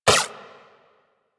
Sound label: Shot, Weapons; Warframe; Moa Pet; Tazicor